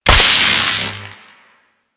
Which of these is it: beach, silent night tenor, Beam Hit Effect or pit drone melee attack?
Beam Hit Effect